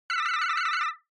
Censor Bleep Synth, Short

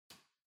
Light Switch-Rocker (Far-Hall-Gentle)

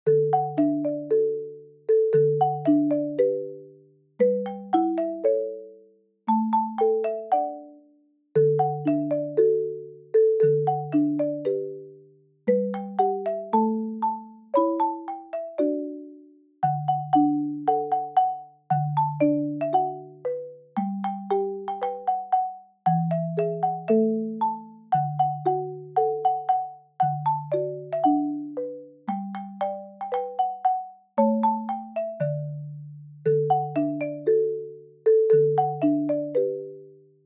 Fast; bgm; Jingle Bells

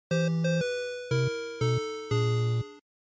NES General Game Over1

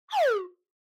Slide Whistle Short Down

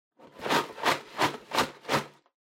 Drawing Box Shake

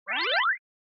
Motion Slide Mid

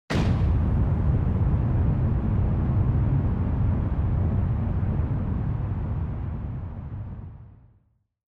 Onoma Rumble Long

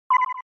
OS Sound Notification

